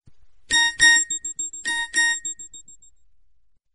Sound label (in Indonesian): Nada Dering Hp Nokia Jadul Sms Tone